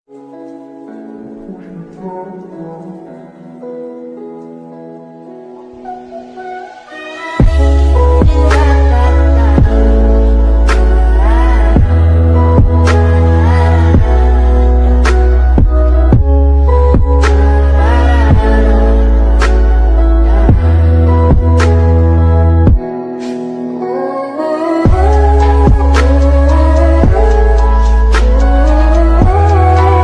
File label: sad ringtone sad bgm ringtone